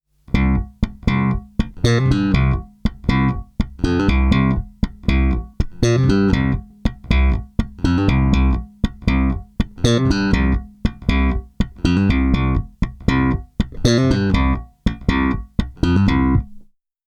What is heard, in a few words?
mic pre bass; rme comparing; ucx2